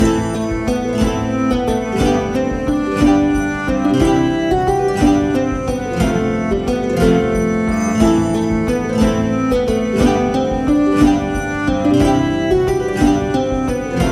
Minstrel loop

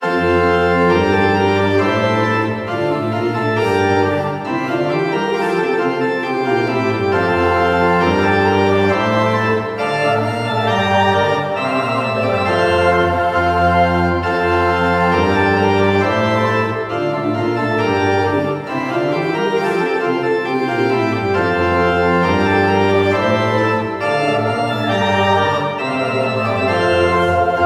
Pray Organ loop

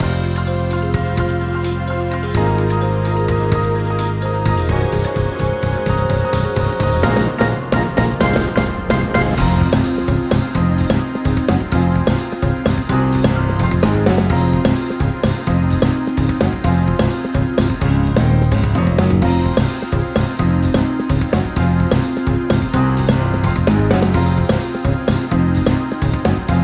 Clock Up

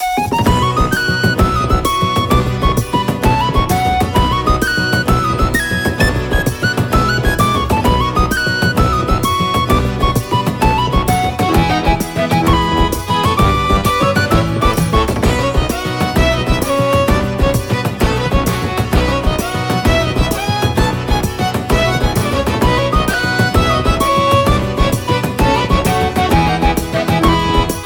Bustling Village loop

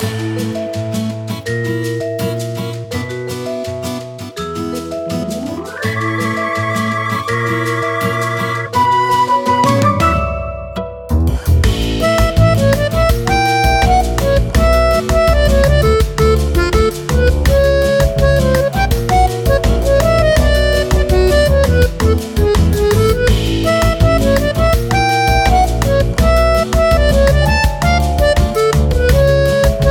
Coffee Lounge loop

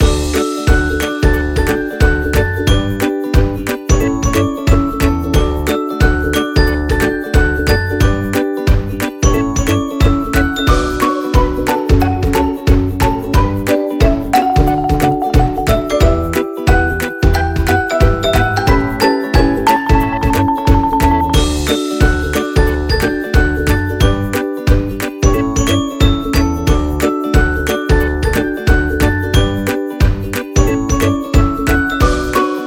Positive Happy loop

